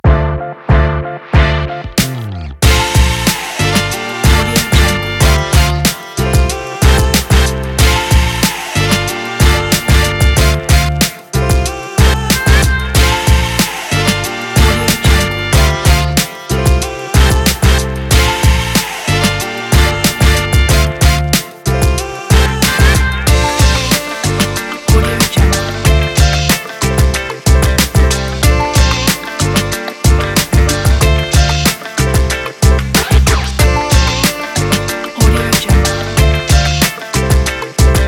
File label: hiphop